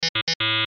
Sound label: Beeps Chime Signal, Electronic Device; Technology